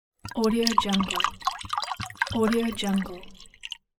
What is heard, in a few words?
pouring boose